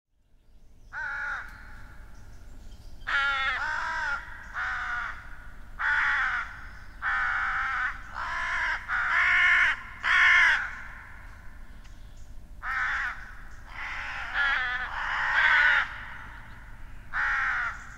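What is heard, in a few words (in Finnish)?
varis, lintuäänet; Venähtävä raakkuva, käheä ”kraa kraa”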